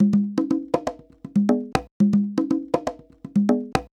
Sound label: Congas 120 bpm, African Drums